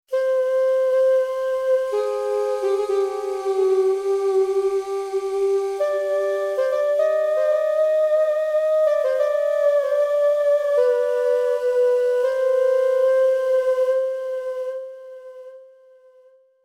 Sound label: Daduk Bend Verb